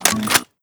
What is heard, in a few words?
ar2 reload rotate; weapons